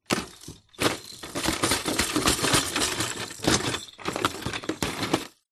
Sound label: percussion items kids in plastic container movements